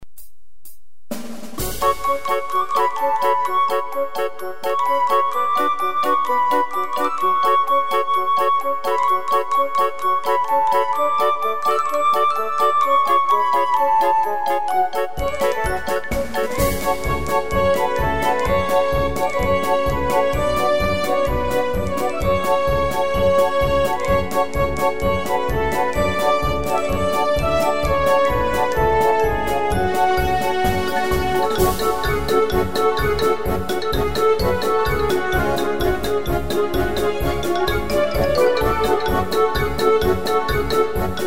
orchestral; tyros4

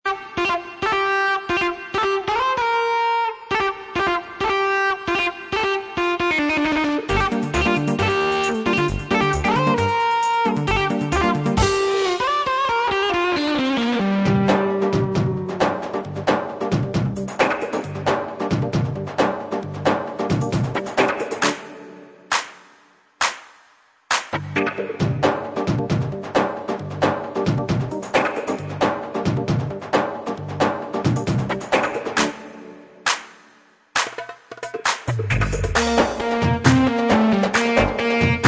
ketron brassy

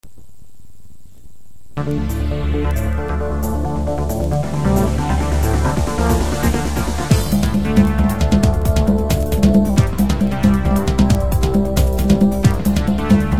azeri; or700

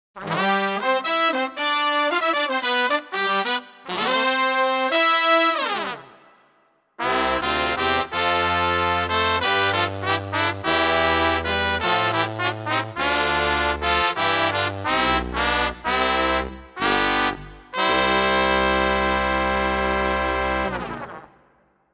yamaha tyros5 ensemble lazy blues

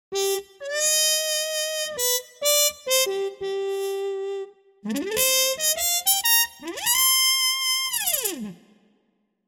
yamaha tyros5 mute trumpet

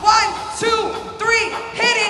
voice pad; sesler

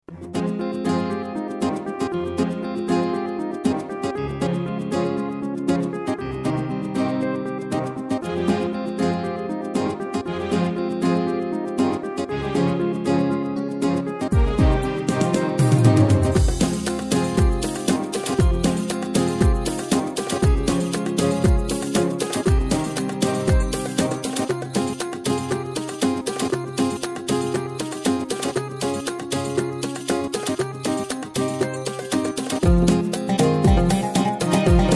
tyros3 soft 4/4

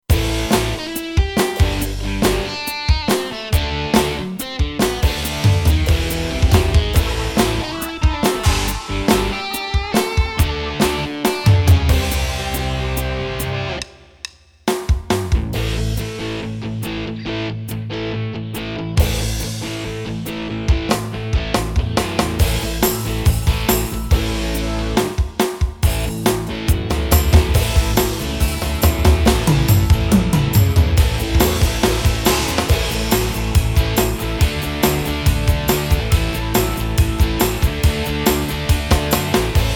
pa3x rock

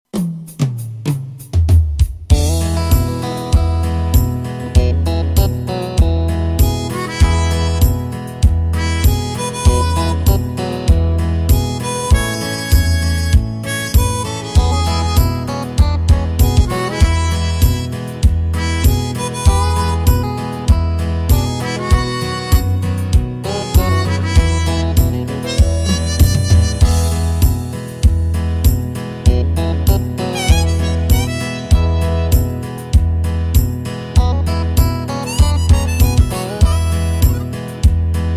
country pa3x

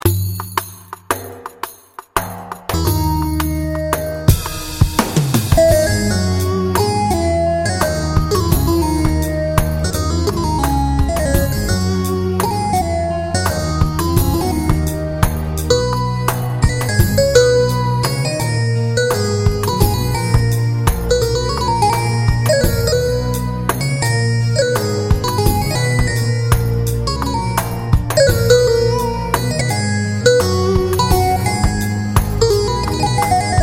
bk-5 elektro

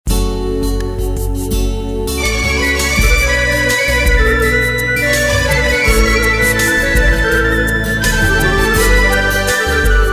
roland g600 slow dogaclama melody

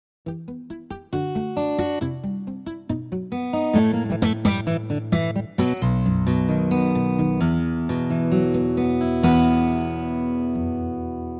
yamaha tyros5 chicken pick guitar

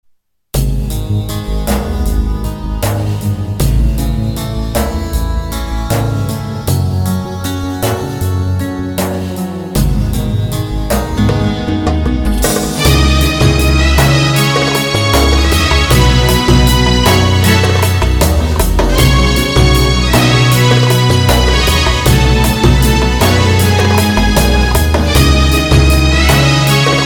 slow vahde, roland gw 8 ritimleri

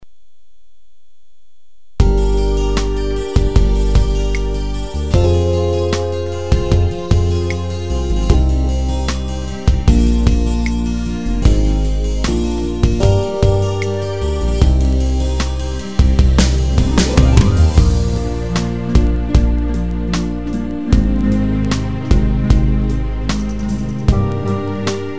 slow beat zurna lead; gw-8 ritimleri

